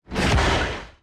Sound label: thruster mechanic move; Factorio